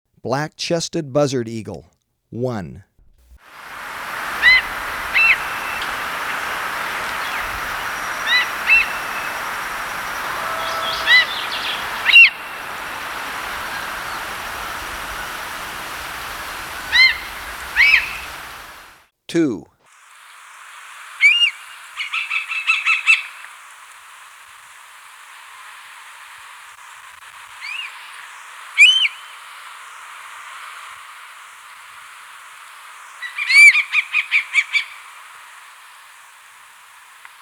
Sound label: Black-Chested Buzzard-Eagle; S. America